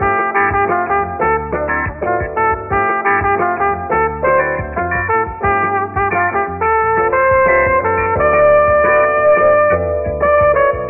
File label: horns, phonky loops